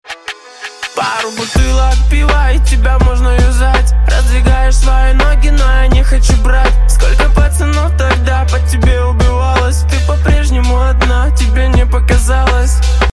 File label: Groove